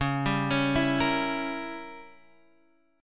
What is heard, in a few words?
Db Diminished 7th Db down Guitar Half Step Down